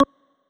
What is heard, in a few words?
button